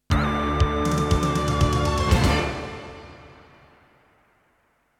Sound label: Mission Complete; Cyber City Oedo 808: Kemono no Alignment; TurboGrafx-16